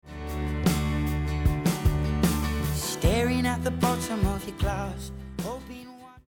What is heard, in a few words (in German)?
Sus Akkorde Klang; Pessenger